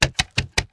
Centaur horse steps

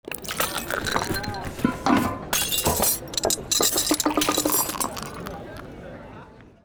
Tavern AMB

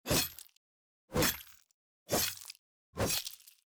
Weapon Quick Slash

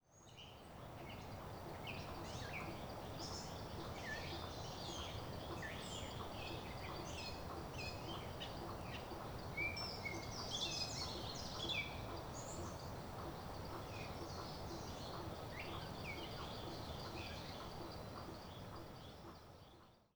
Marsh Pond Ambience General

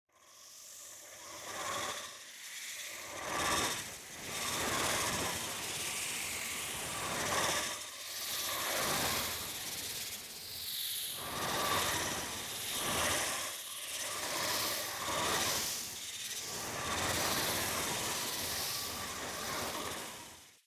Wisp Air

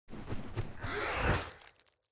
Harpy Movement and Attack

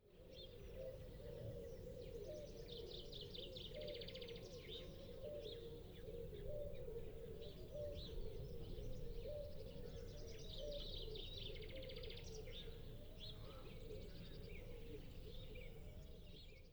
Morning Ambience

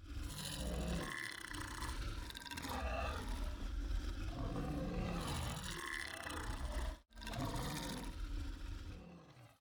Plant Hydra Vocals

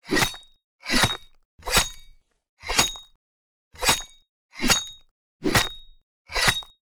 axe 1H

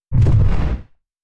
Push

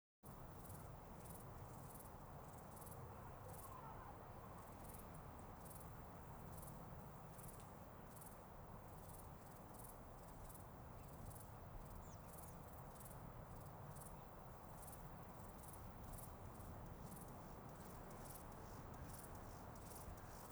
Settlement General Ambience

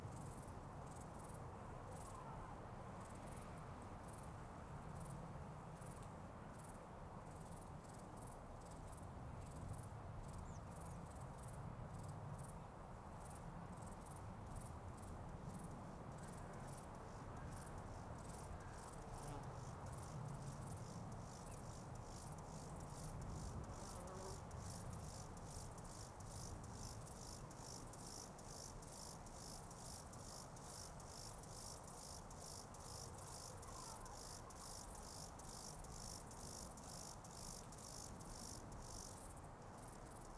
Settlement General Ambience

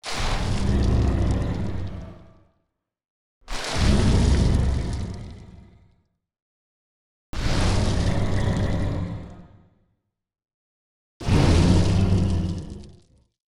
Hit Reaction; Zullra

